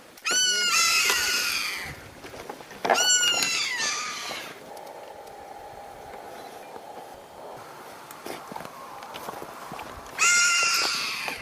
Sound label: Black caracara